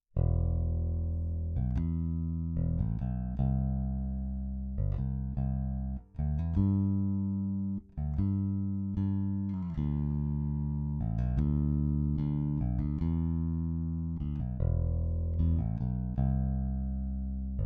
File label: jensen bass 12db